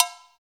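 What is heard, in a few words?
percussion cowbell; Roland L-CD701; PRC Latin 1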